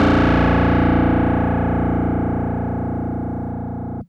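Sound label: Fuzz Clav DX100 A#1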